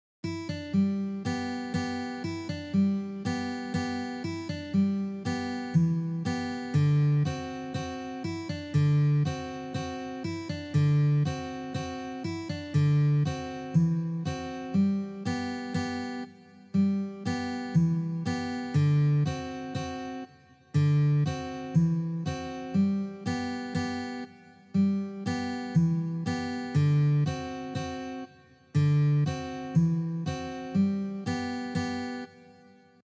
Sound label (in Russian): na gitare; Sobachij vals